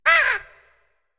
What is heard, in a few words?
Caw